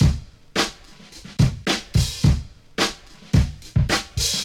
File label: Drum Break Hip Hop; Ableton; Breakbeats by KutMasta Kurt